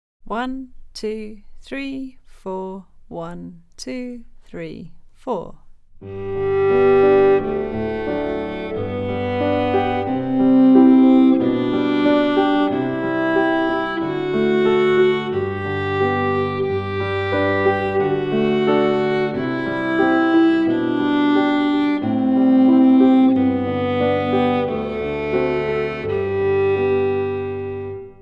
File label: G major scale, violin